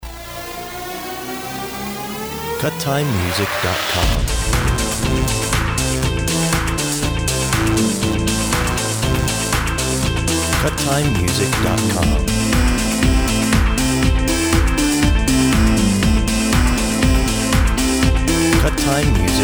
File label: Orchestral